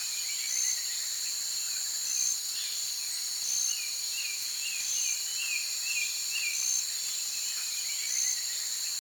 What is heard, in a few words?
Amb Lagoon Surface